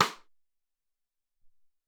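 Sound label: Good Stick, Alesis SR-18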